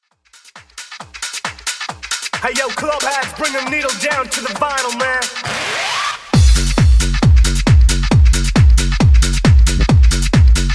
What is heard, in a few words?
90s Xtend 135bpm